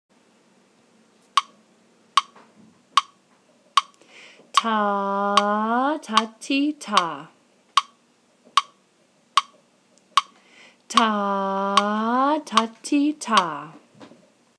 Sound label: circle rhythm